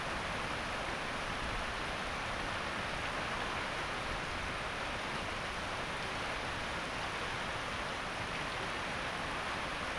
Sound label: rain